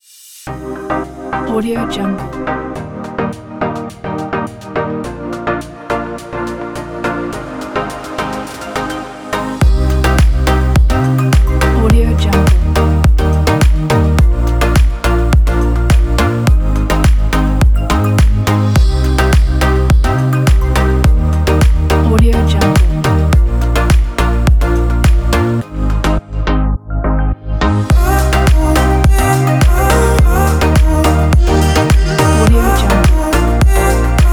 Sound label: ibiza dream; loopslab